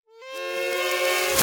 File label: Birthday Card Place